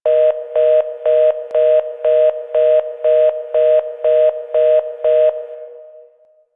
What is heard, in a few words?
Telephone Booth Loop; Warframe; Ambience; NineteenNinetyNine